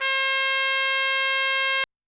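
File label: trumpet; Yamaha DTXpress-II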